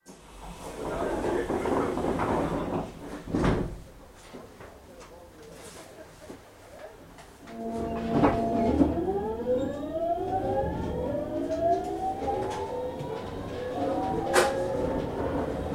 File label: train start